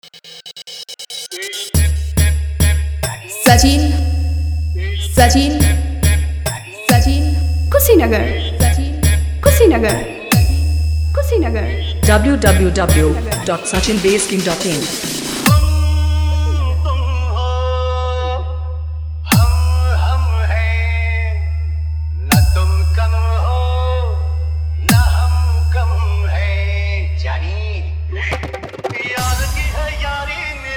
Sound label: Hindi Dj Remix Songs Hard Vibration Bass Mix